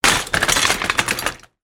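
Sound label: bicycles x2 impact; transport; bicycels x2 impact; zapsplat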